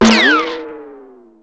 Sneeze; Mia's Reading Adventure The Search for Grandma's Remedy; MacOS, Windows

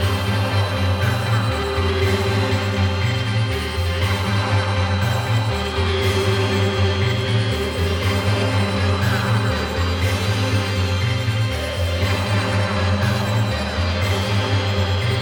Death Sequence Loop; We Happy Few

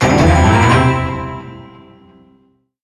Level Success; luigis mansion dark moon; 3ds switch; Chilly Ride

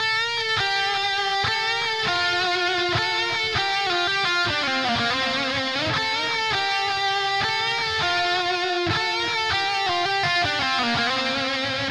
Drums and Jingles Battle LEAD

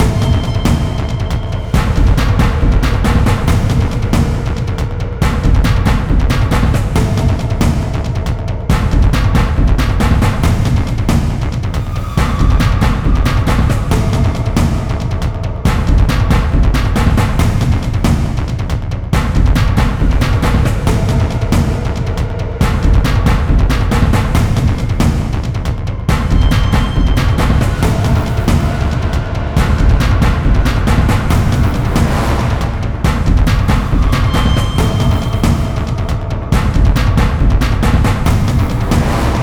Action Loop

